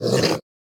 Damage

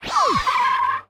spider boss massive attacked

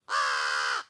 AMB China 3D Amb

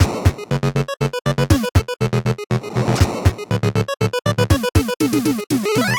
Build Up; A Pixel Story; Robots Attack

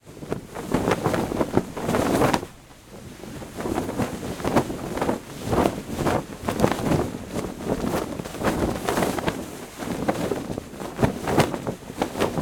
Flap, Fabric

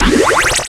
Pickup; Reckless Getaway; Android, iOS

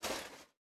Mech Footstep Texture Movement; starbites

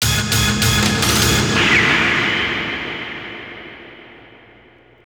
Str G#

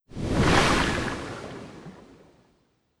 Deep Water

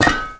Metal footstep; starbites

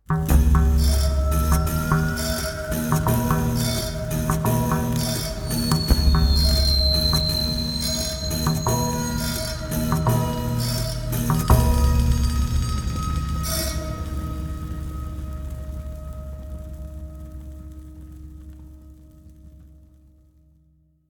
village mole people; curious expedition 2 macos ps4 switch windows xbox one xbox series xs gamerip 2021

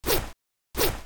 Achievement screen

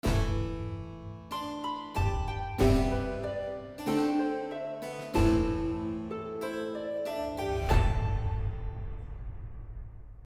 Harp Suite; Kingdoms and Castles; Merchants and Ports

